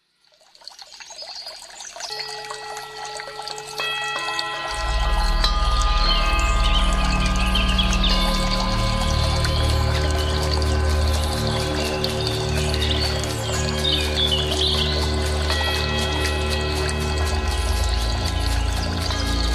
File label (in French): natural musique tambour chamanique